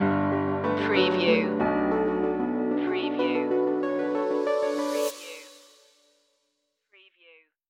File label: stinger